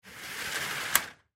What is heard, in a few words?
Curtain Slide Close; Window; Household; Blastwave